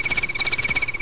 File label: CHIRRUP; ANIMALS